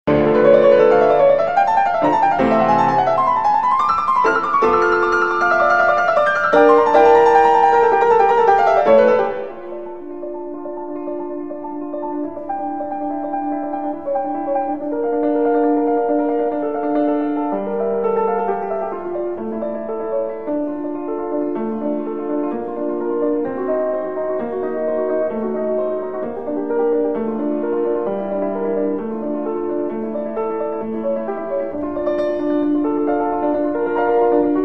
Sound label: Chaconne in D minor; Bach Busoni; BWV 1004